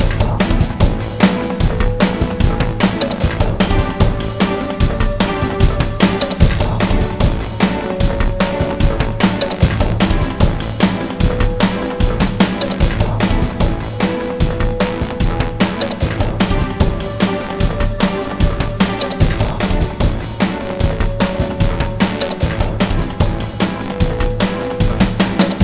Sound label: Industrial Energy; kineticism